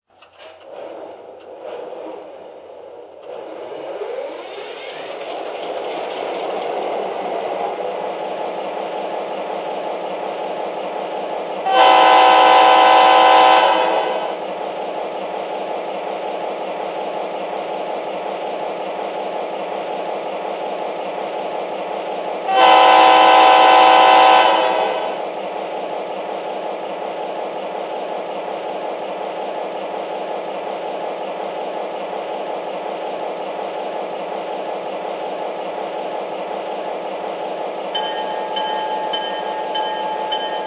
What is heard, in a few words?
gp40, trains